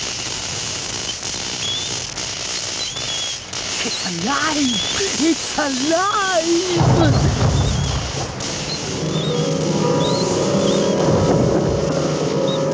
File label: string piano pluck; halloween